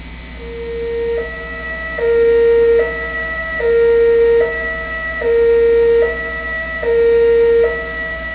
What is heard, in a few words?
Siren Hazmat; police